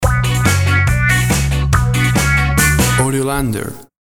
These Trousers are Baggy Stinger

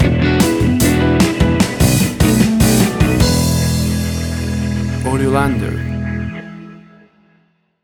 60s Swinging Blues Rock Stinger

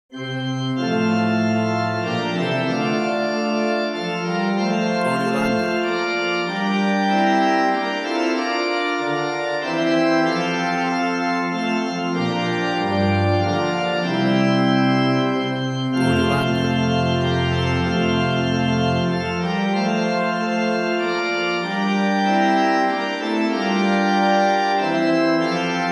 Away In A Manger Church Organ

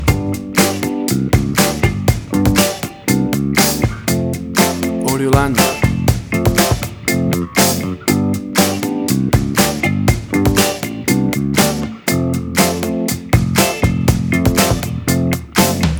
Easy Funky Loop; A cool and relaxed funky; EASY FUNKY LOOP 1

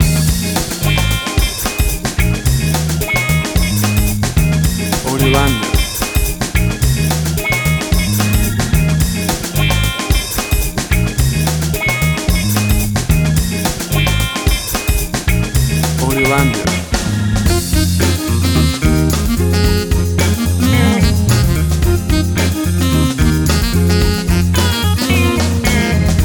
60s Early Funky Blues

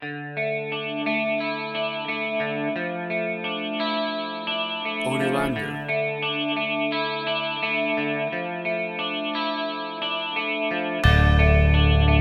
Demon Bells

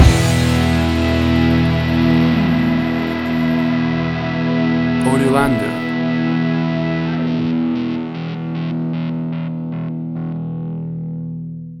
80s Street Rock Stinger

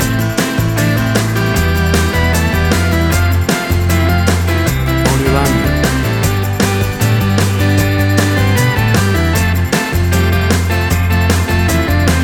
60s Organ Rocking

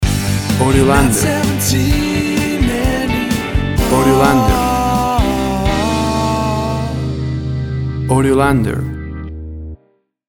Your Not 17 Anymore Short Stinger Mix

